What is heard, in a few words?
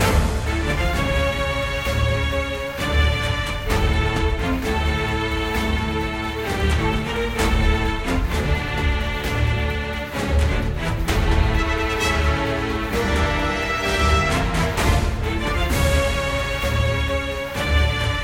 patriot loop; 明日方舟